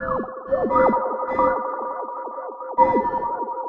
Sci-Fi Radio 130BPM

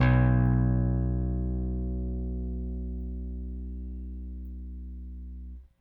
RickThumb-C, RickenbackerBass

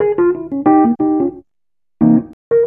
ACID JAZZ

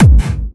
Clubby Kicks, Bassdrums; Vengeance; Essential Clubsounds Vol.4

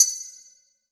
RIDE, CR-78 DRUMS; EdgeSounds; Drum Mashines VOL-1

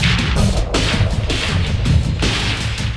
LOOP, Roland; Sound & Vision - Gigapack I

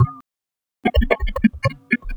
Beat, Electronic Beats; Sonic Foundry (Sony Creative Software); Myths of Technology; Hollow Gate Beat

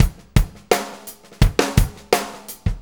Fonky, Drum Tools, Loops; Sonic Foundry (Sony Creative Software); Loops & Samples Drum Tools

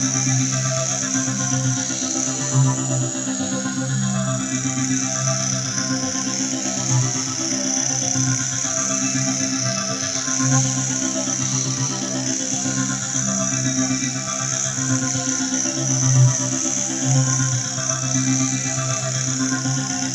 Arpeggio, keys; Sonic Foundry; James Johnson Spektral Minimalism; Sublime Keys

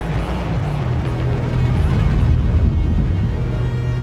Kaos FX, Ambient Grooves

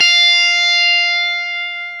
GTR TUBE; Dirty; Club-50 - Foundations; Paul-Stack

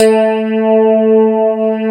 CLAV, FUNK FM CLAV

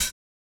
OH, HATS; Omnipresent; RoBKTA Sample Pack Supreme

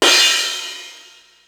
Dance Crash, Ensoniq ASR-X Pro

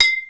BOTTLES, HR-16; Hollywood Edge; The Big Bad Beatmachine; HR-16B

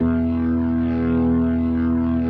E1, B3

